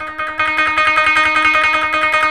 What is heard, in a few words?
KOTO TREM